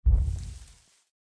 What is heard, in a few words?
Distant Rumble